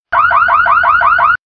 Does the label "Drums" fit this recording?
No